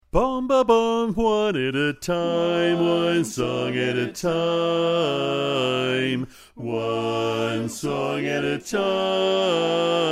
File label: Bass